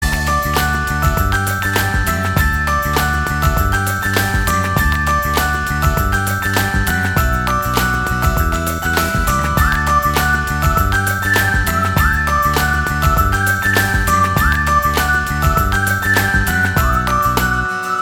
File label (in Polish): dzwonek; Krispol; Lukasz Piszczek